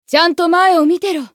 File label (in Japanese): 移動; 迅雷のアドリアナ